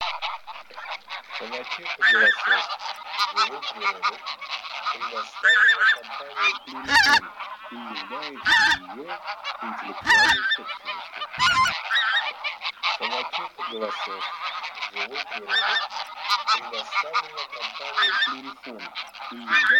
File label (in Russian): Гусь базар Oche Mix